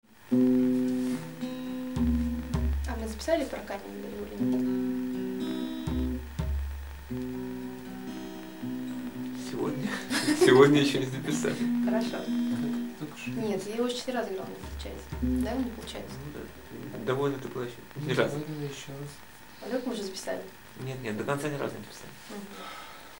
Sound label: sigh